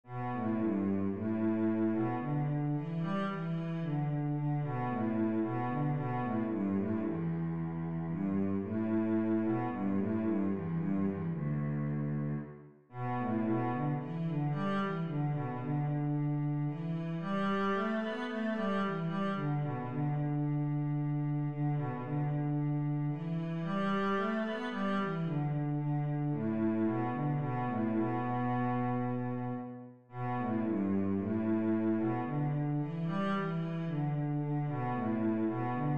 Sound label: jasmine cello